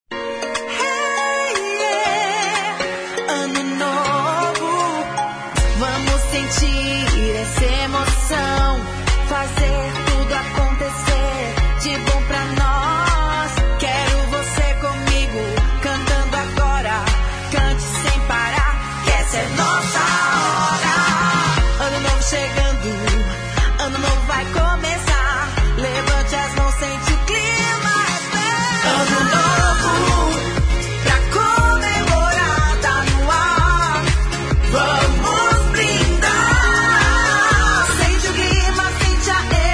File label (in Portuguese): CHAMADA CANTADA; CENTRAL DE NOTICIAS; icmbio